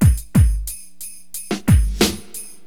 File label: Drums loop, HipHop; LiveAct Pool